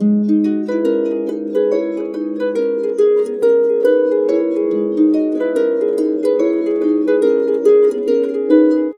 harp dna swung

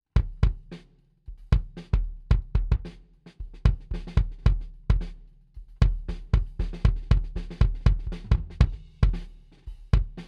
kick head on mid; D112

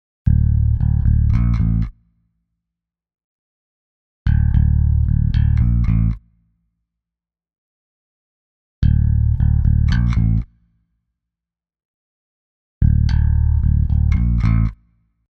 bass processed

